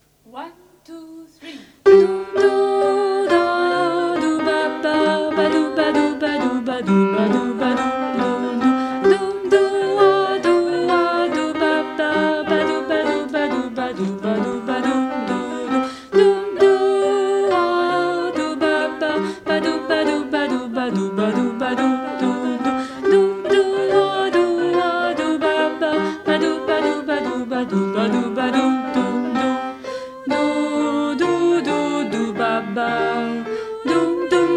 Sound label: alto